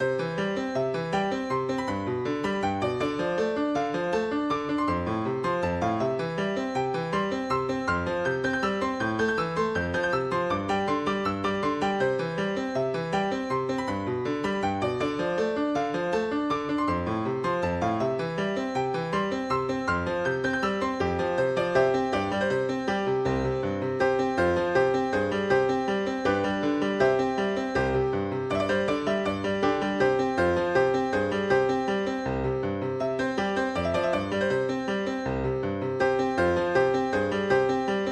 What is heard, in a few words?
March Piano; March 9